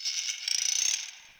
Landscape Plant Critter, Ambience; One Offs; Warframe; Venus Open World

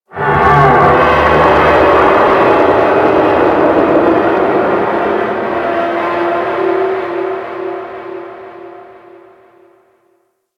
Ocean Pressure Creak; Ambience; Warframe; Grineer Ocean

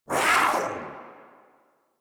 Kavat Vocal Attack Short; Warframe; Khora

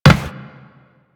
Run Metal, Footsteps; Warframe; Teshin

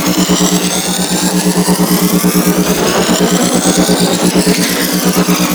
Shield Loop; Abilities Redux; Warframe; Captain Vor

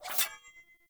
Sword Accent Blade; Weapons; Player; Swords

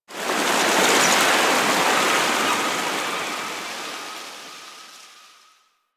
Waterfall Amb; Comic; Warframe; Quests; Yareli Quest

